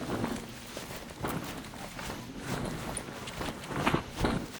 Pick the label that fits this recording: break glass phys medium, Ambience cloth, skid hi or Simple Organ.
Ambience cloth